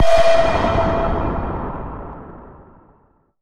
jumpscare noise

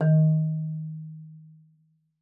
marimba D#3; Xsample VOL-4

